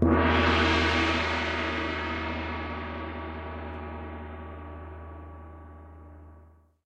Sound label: gong; cube ninja